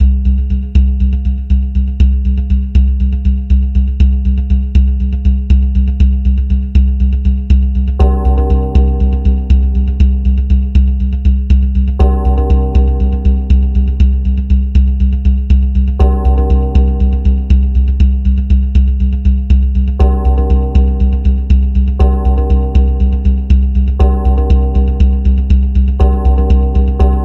Dead suspense loop

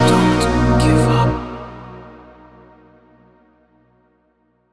Retry Jingle; Vs Robo Shelton

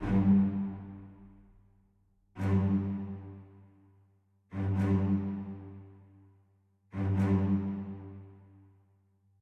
Ship Tension; Mass Effect 2; Cerberus Ship Tension II

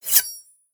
Knife

